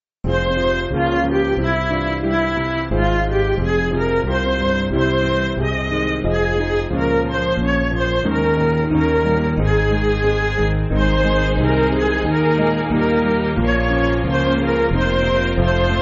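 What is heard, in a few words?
Midi, Music Backing Files for Churches; Fairest Lord Jesus; Munster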